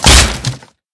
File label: woodbreak, mob zombie; ayuncraft-1.5